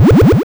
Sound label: teleport low; bfxr; Kouryuu